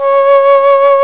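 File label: Alto Flute vibrato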